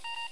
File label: Beep Beep; activegs ios